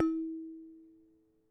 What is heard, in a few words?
bell plate node second mode; bellplates